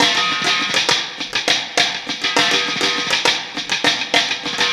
Snare Particle, Processed Drumkits; Zero Gravity Beats

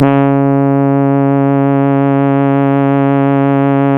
C#, Synclav FM, BRASS; Big Fish Audio

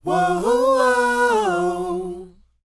WHOA F#, Vocal R&B & Dance; ILIO; Vocal Planet

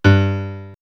House Piano; X-Static Goldmine; backtothe70's

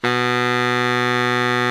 BARI FF B 1, Sax; Giga Samples Collection; BARI 3-WAY

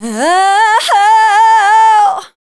Dance Vocals, FEMALE

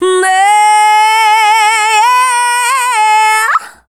Dance Vocals, FEMALE

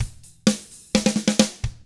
surf rock, Drums; ILIO; Double Platinum Drums 1

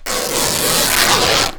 RIP, Canvas Bag Rips

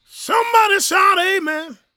gospel male, vocal; ILIO; Vocal Planet

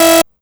Synth Hit E

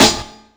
SP1200 snare; E-MU Formula 4000 Series Vol. 1; Hip Hop Nation

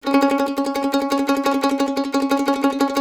CEE TRILL C# L, Blues Guitar

